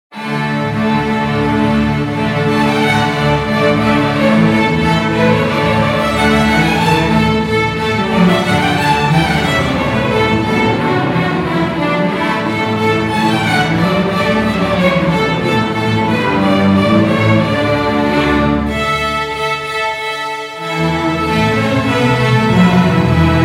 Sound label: Violins